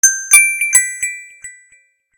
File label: Singing, notifications; Huawei Ascend Y330